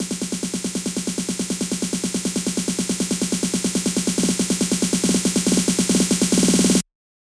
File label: 140BPM SNARE; 4Bars; Vengeance Samples; Snares&FX Pairs